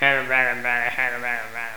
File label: grumble